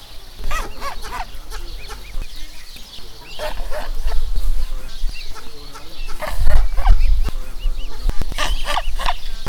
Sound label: Cygnus olor female; Mute swan; A female leads her chicks over the lawn